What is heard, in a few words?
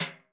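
brush soft; Northstar - New Gold VOL-2; 30S BRUSH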